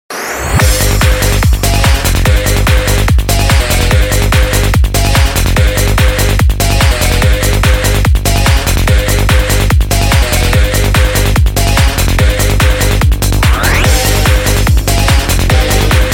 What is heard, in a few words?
Goa Groove